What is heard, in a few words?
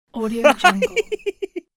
thin male joke laugh